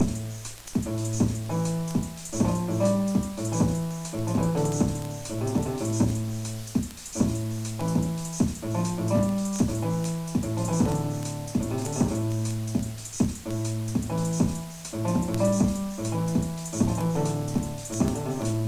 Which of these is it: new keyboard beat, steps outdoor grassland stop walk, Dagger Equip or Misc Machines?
new keyboard beat